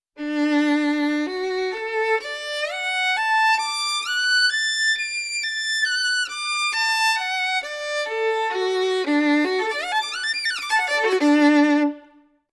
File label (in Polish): skrzypce